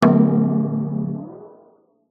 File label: CARTOON PERCUSSIVE Percussion; smartsound